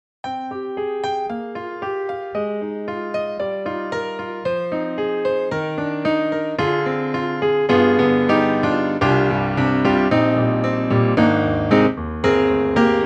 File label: Grand Rhapsody Piano Init; Waves